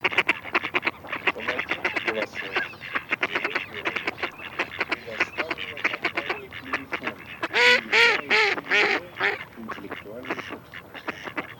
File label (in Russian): Кряква Germano; plurifon